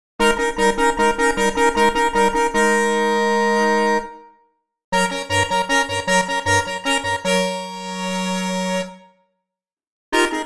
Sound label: FM Horn; ewI Voicing